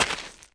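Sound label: footstep gravel; UrbanChaos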